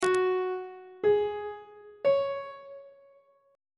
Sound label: f sharp suspended 2nd triad chord intervals on treble clef